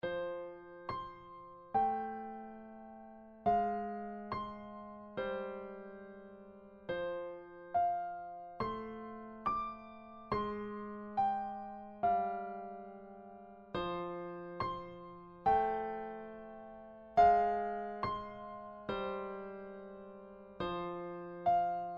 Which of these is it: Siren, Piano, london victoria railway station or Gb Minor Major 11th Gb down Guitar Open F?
Piano